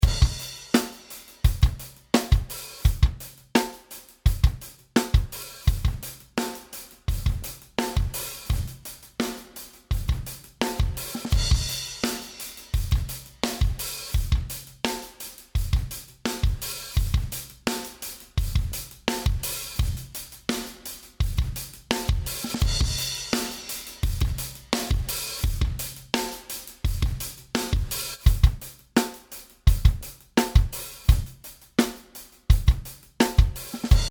Drums All

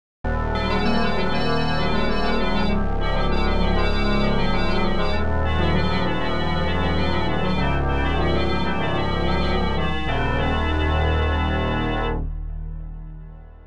Arturia SQ80 V Factory Pink Life

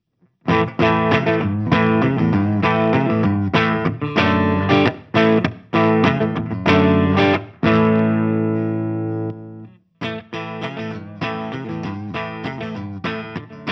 BCRich The Rich 6 string Neck Pickup 5 way switch

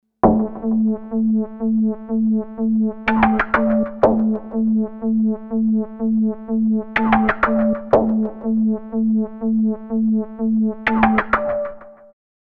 Technoid Arp; Mayer MD900

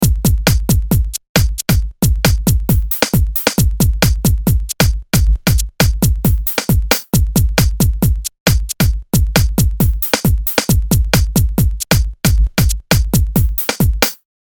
Original Beat

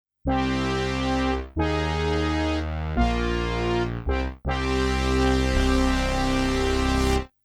Analog Brass

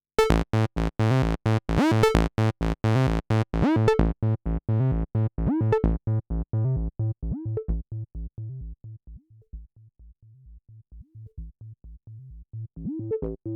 filter

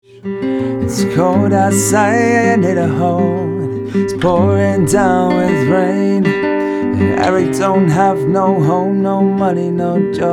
Vox Git Ribbon Mic; WA76; Afast; BM9

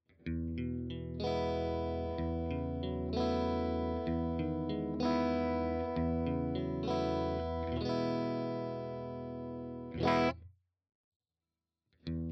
Woodrow 55 All speakers KP 3K

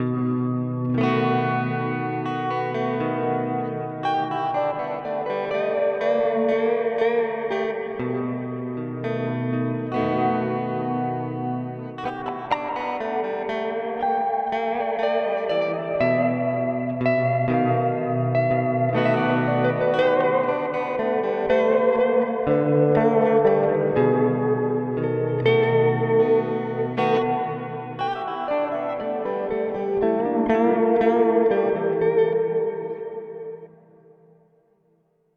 Mooer R7 X2 Plate

